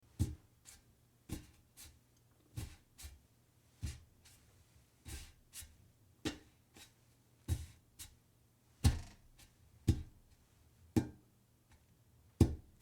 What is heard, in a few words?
hands touching sink